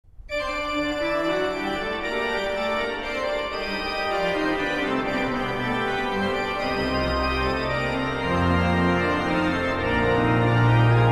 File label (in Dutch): orgel